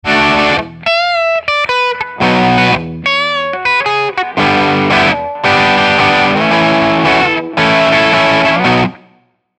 Gibson Robot LP Bridge OD

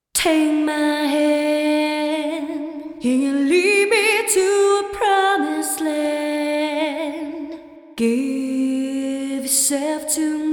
Sony DRE 2000 Vocal Reverb

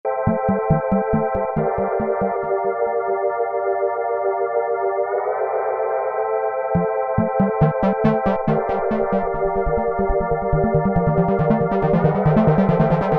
Korg Electribe EM 1 Filter Acid

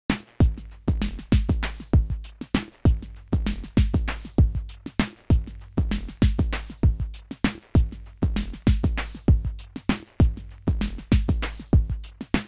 Microtonic Pattern; Sonic Charge

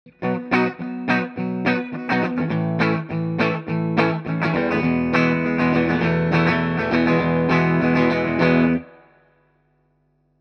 414 Omni E Guitar Clean Chords

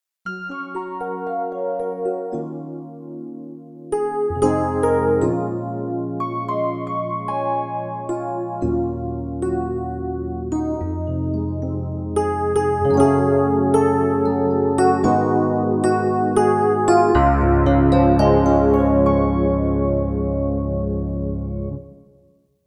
Clavia Nord Grand Digital EP

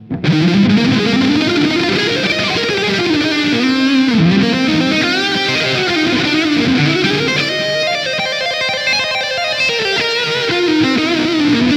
Liquid Solo line

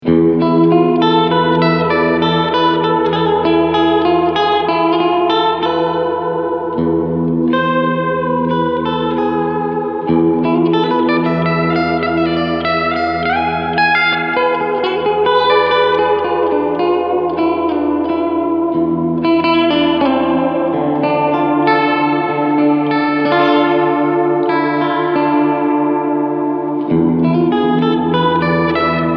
Chorus

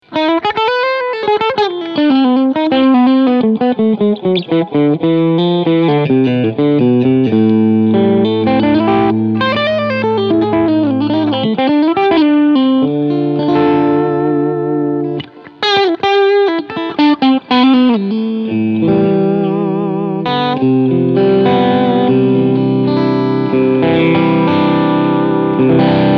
Crunch Pos. 4

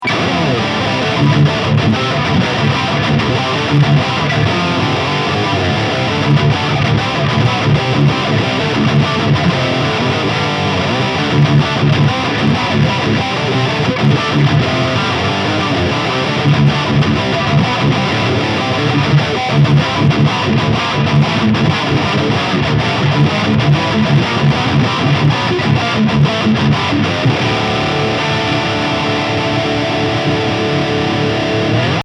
ESP Warbird High Gain

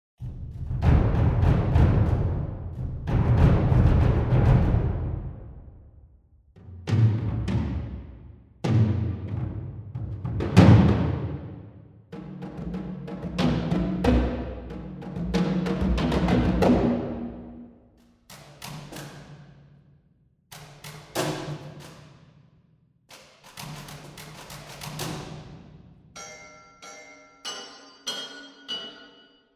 Percussion Ensemble; Albion One

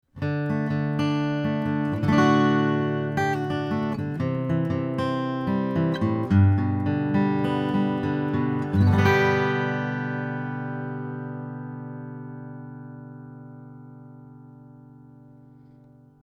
AKG414XLS Acoustic Git Pick 20cm 15 Fret A

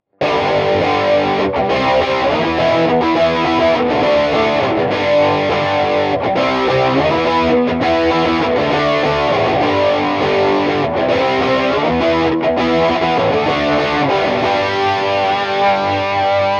Scuffham Amps S-Gear Rockman

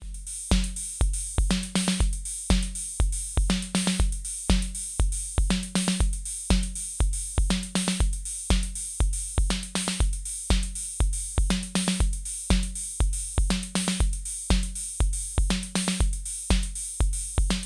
Elektron Analog Rytm Natural SD

